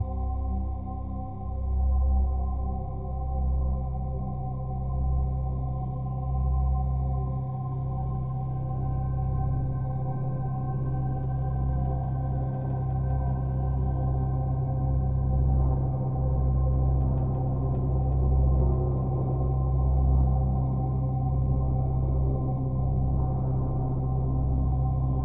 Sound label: Sanctuary Exploration 8CH 80BPM